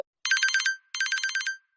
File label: Monkey, Notification